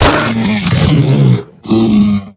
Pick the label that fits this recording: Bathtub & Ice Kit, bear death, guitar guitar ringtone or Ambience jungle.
bear death